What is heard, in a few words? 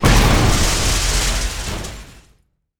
car crash wreck